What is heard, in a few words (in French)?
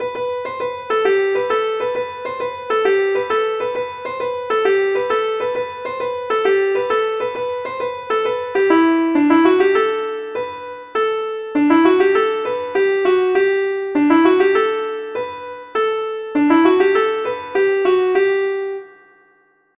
suite passes pieds